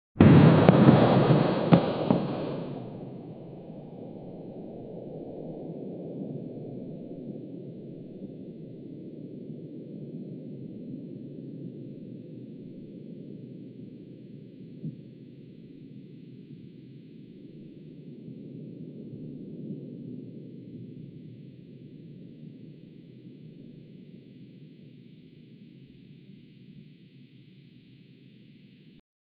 golf 6 r, accelerate; peny przelot

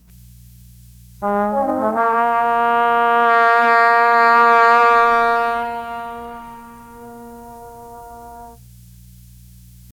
1950s small jazz combo, upright bass

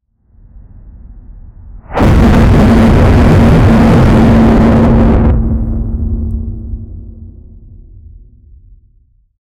air tightens pressure dro; apocalypse

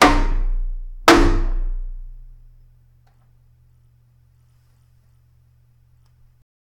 the man slammed his palm, table

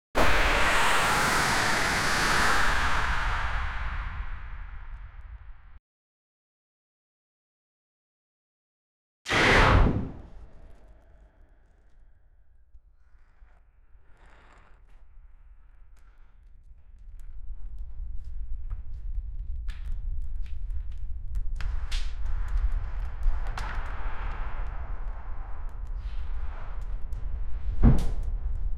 video game realistic firs; fps

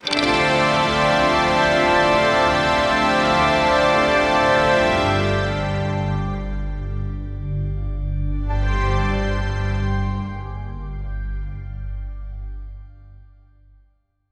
slot scatter hit, brass

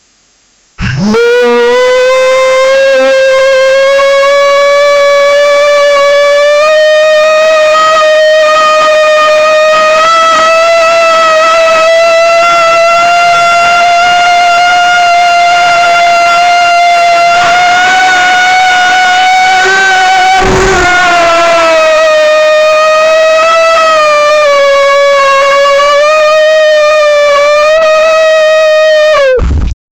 muttering sound, human vocalizations

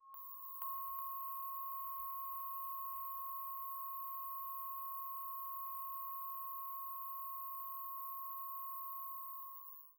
energy

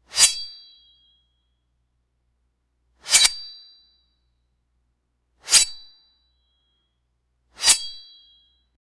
a sword slicing through; sword slice

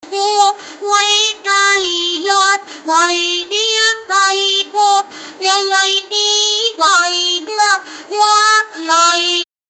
jay jay kara music only, indian

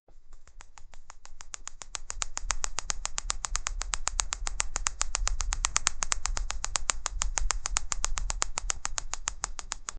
creepy spider walking sou

